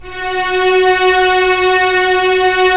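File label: STR String Section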